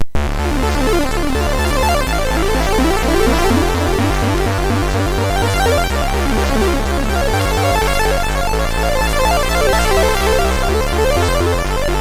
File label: MOS 6581; MOS6581R3; hvsc